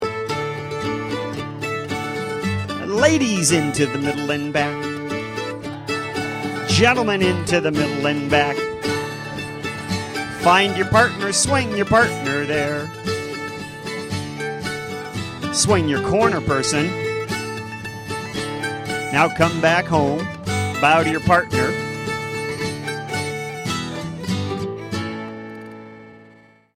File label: String Band Dance Caller Wedding Polka; Bowdoinham; calling the circle dance with the tune "Mari's Wedding"